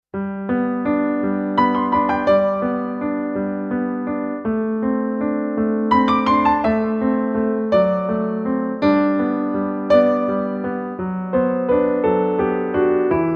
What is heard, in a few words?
Sleepy Shores, Solo